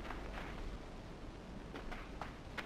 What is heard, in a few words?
jump; limbo